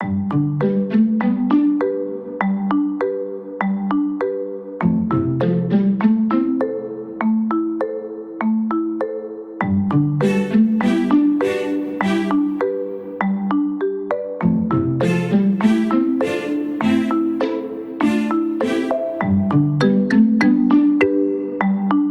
Bgm Fantasy

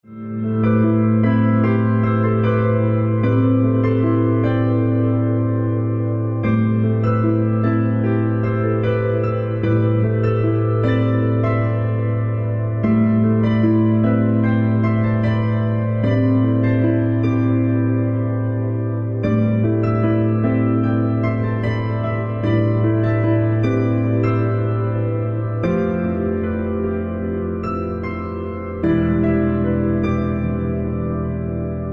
iceberg; minecraft

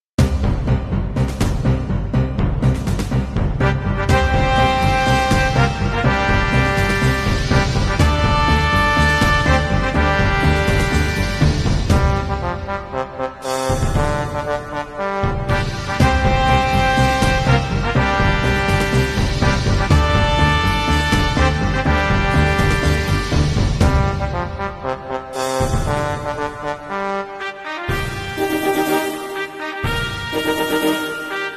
victory; ultraman

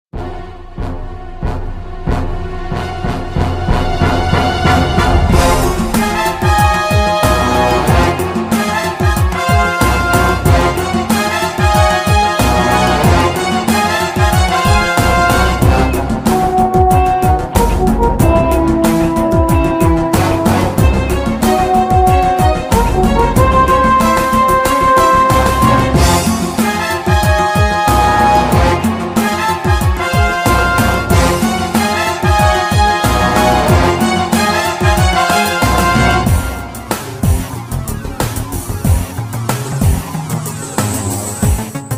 fighting; gaia

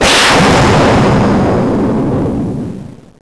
spark

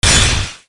ice

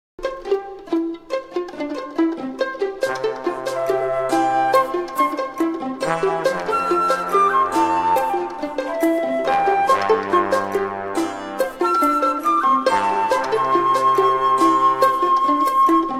clear water

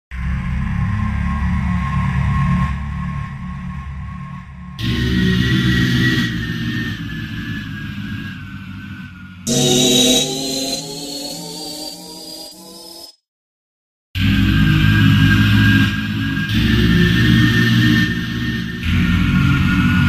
jumpscare; CatLegend2

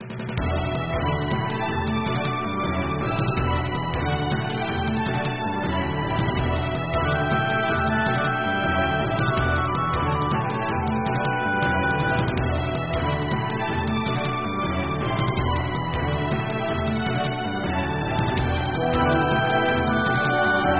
Battle

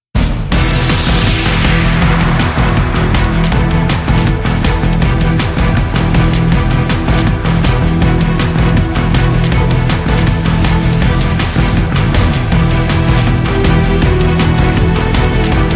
boss battle

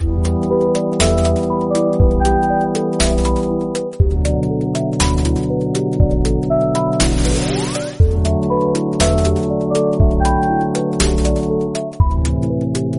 rune array loop

